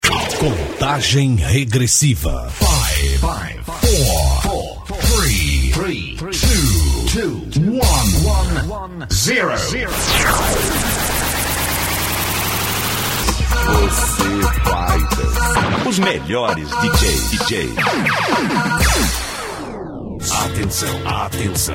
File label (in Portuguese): vinheta contagem regressiva de abertura